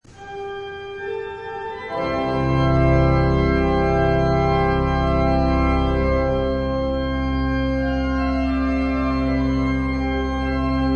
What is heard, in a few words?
Organ interlude